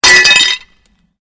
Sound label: GLASS SHARD SMASH